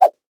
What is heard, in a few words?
miss; weapons